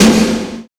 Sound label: snare+hat; DRUMS